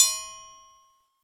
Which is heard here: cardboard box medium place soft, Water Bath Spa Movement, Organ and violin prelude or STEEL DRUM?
STEEL DRUM